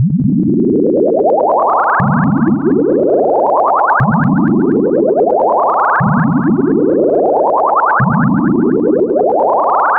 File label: bubbles; synthesizer; lange-nacht-2009